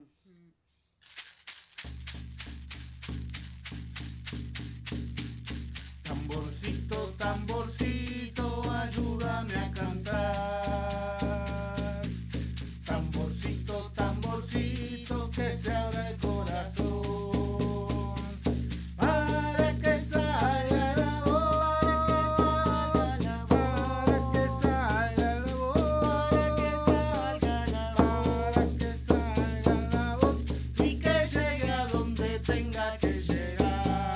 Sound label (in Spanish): Tamborcito